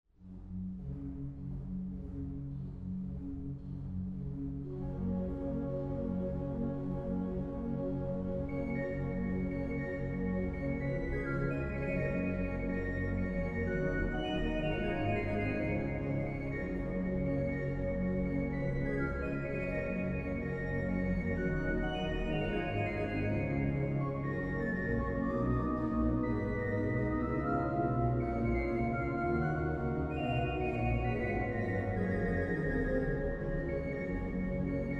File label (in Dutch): orgel; Gronings volkslied